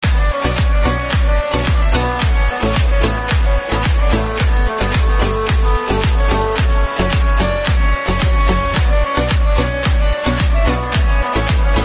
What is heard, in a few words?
instrumental